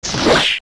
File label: Poe Die; zelda; OOT